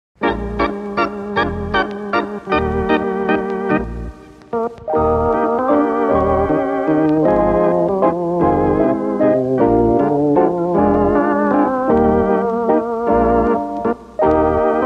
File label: waltz (instrumental)